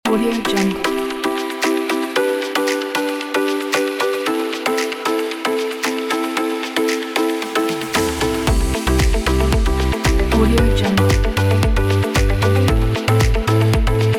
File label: fashion pop music